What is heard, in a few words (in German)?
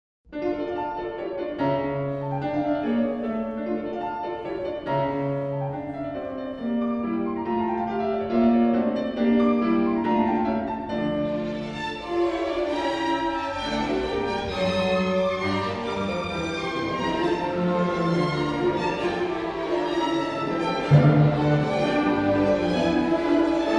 Mozart Klavierkonz d moll 3 Satz